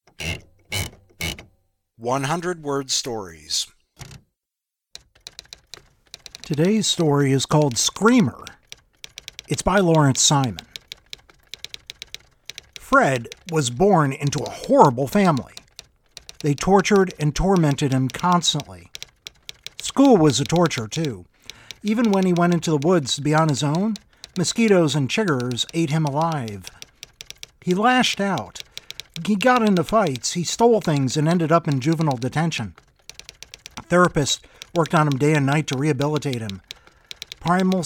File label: screamer